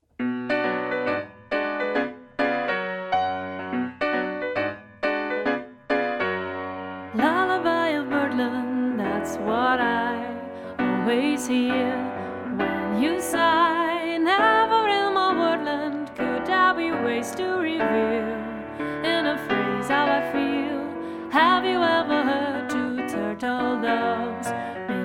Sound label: lullaby